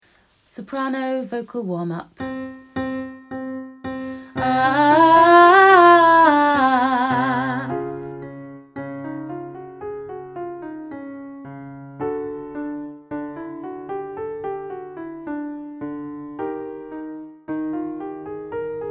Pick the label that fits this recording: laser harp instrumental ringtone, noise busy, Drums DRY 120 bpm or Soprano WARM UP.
Soprano WARM UP